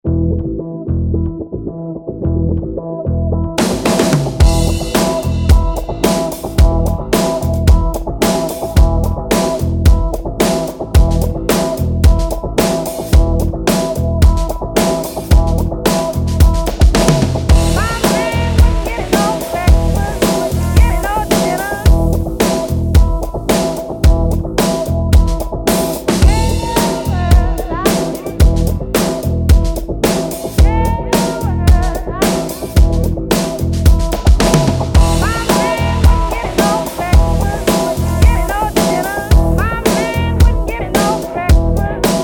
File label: Elektromorph elektro